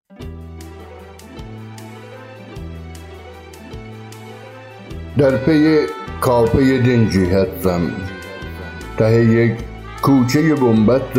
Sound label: Vocal